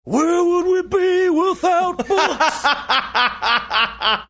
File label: singing where would we be without books